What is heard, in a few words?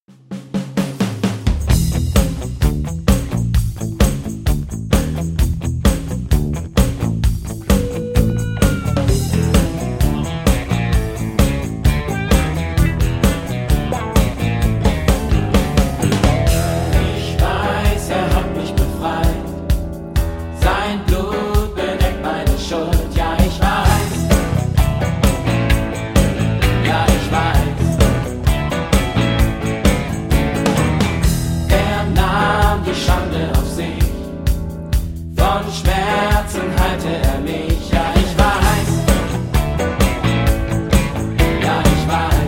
Tenor